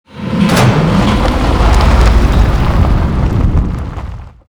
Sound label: mohole submerge long